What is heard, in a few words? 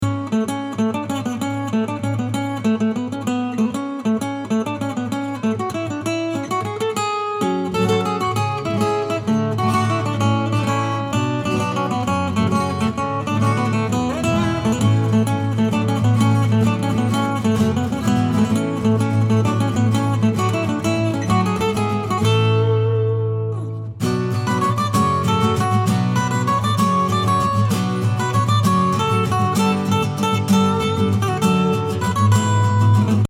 Acoustic; Jib Jig